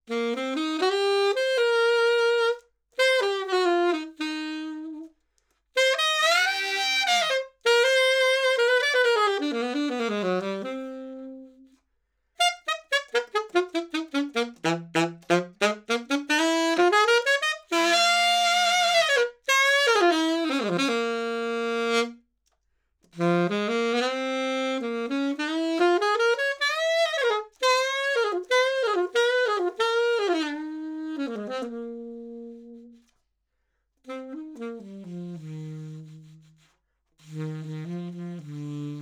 Sax Alto 50cm C High

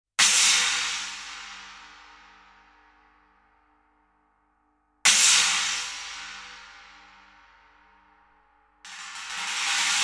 Cymb China; 60 Degrees From Vertical

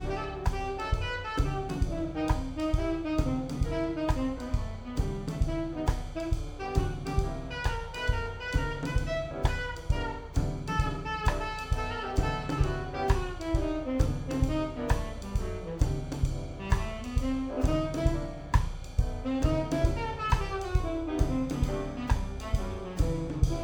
Spill Enhancement Solo Drums; Recording Mistakes

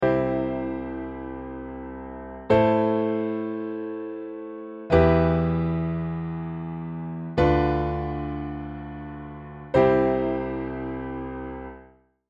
Chords

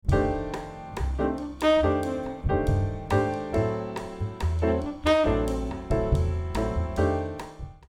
Sax Meld Inv

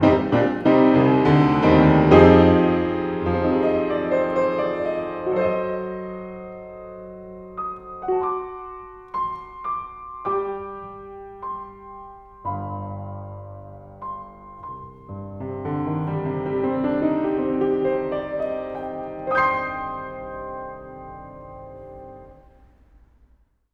Piano Location Centre Of Hall

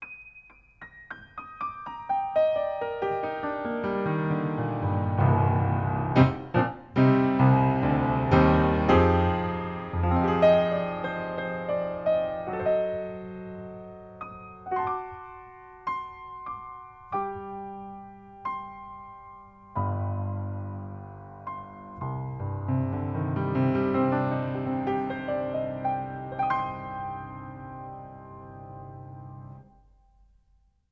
Piano, Front To Back Mic

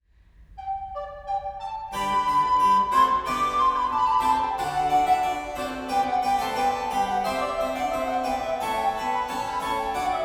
Dual Array Wet Dry Duo Room AB

